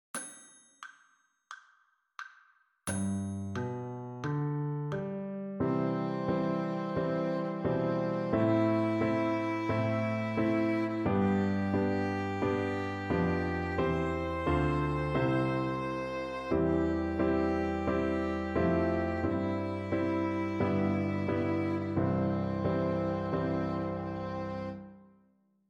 C major arpeggio

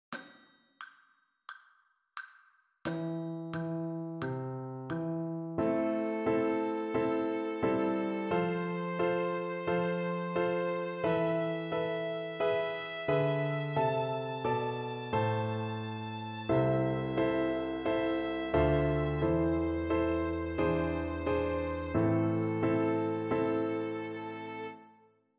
A minor arpeggio

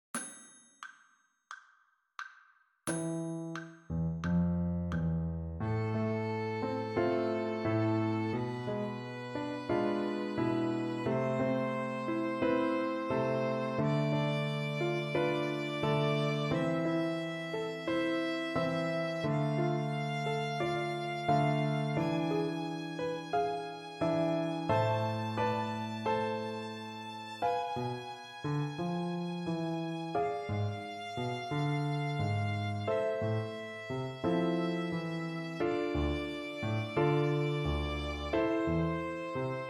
A harmonic minor scale, fiddle; Fiddle Time Scales 1

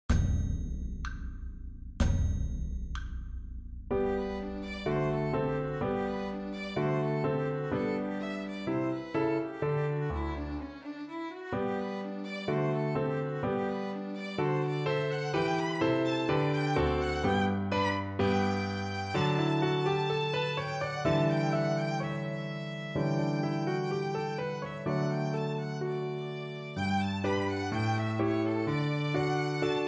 Rondo alla Hornpipe; Grade 3; Barthelemon

